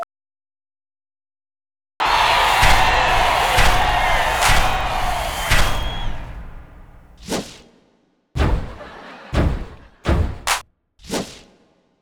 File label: SFX; Back To School